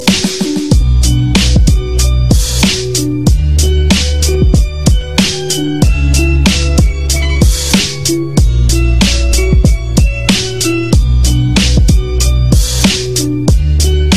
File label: black beat, hip hop